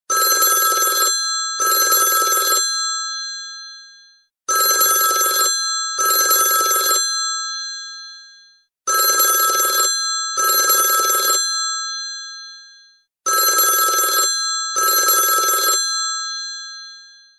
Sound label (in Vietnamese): Tiếng Điện Thoại Bàn Reo Reng Reng